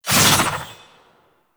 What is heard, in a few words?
Fairy Sword Swoosh